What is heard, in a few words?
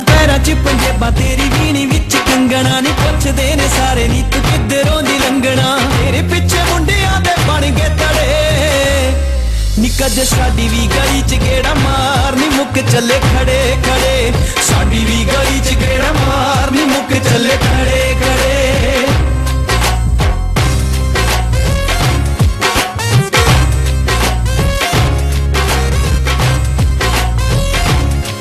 punjabi song